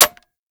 Magnum Mech; Warframe; Tenno

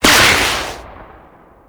Royal Guard Jumping Smash Activate; Warframe; Grineer; Abilities

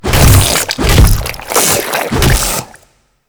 Infested Ancient Toxic Tentacle Swing Flesh

